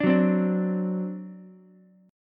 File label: C Suspended 2nd Flat 5th Ukulele, Csus2b5 chord; Csus2b5 strummed